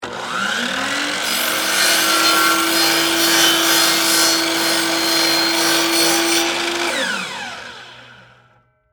Miter Saw Cut Wood, Industry, Miter, Saw, Cut, 2X4, Wood, On, Run, Off; Saw Sound Effects; BlastWave